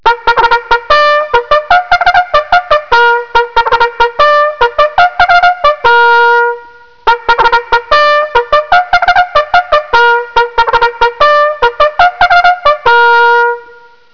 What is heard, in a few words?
recall, bugle